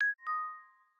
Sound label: Wireless Charging Finished Pure; LG K83 5G